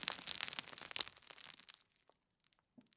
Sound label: Rockfall; Counter-Strike Global Offensive; PS3 Windows Xbox 360